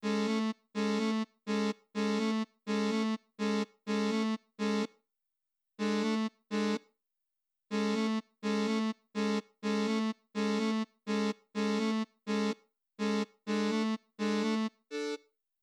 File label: dist red pad solo